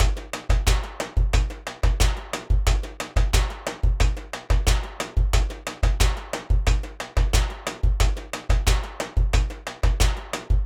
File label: junkyard rhythms, drums